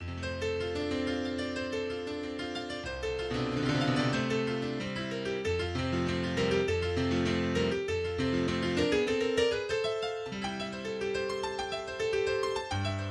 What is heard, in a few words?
Prelude in F Major